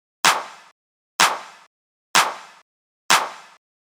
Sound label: Clap